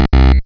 deny; opensurge

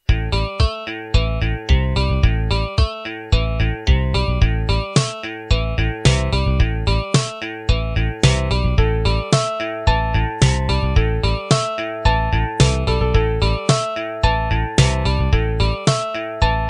assembly line

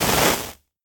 fire; lix

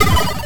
enemy hit; mazeofgalious

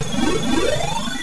rotate door; mazeofgalious

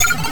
blob; mazeofgalious

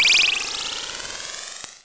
big ring; opensurge

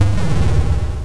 gorilla; mazeofgalious

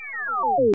close; mtp-target

